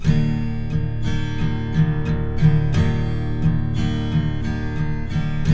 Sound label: Guitars; Spectrasonics; Hans Zimmer Guitars VOL-1